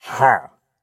待机声; 掠夺者

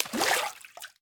炼药锅染色声

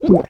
女巫饮用声

